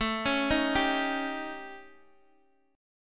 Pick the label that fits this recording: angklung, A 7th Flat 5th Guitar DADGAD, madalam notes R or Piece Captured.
A 7th Flat 5th Guitar DADGAD